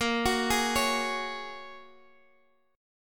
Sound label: Bb Minor 7th Sharp 5th, Guitar, Standard; x,x,8,11,9,9; arpeggiated; chord shape